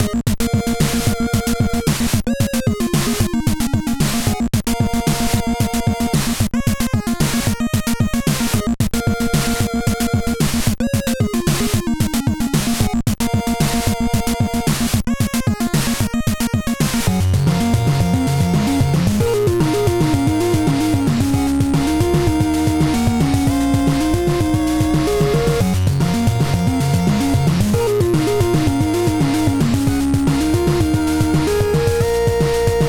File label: Hurry! (LOOP); music